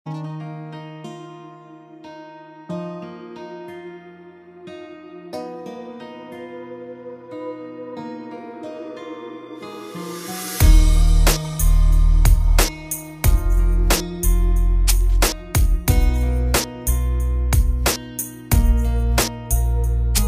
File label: HARD DARK EMOTIONAL INSTRUMENTAL RINGTONE